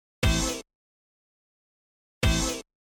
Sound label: hit